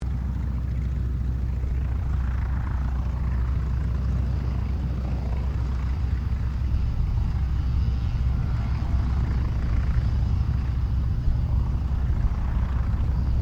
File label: AIRCRAFT CAC WIRRAWAY IDLE DISTANT STEREO; CA-16 Wirraway; Airplanes; Vehicles